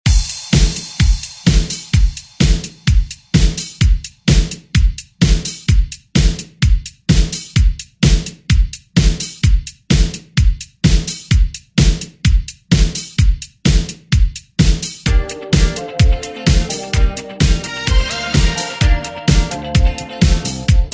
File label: 80s Re Drum